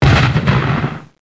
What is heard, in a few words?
CANON, Guns; PaxImp